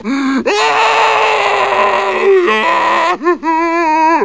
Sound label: zombie on fire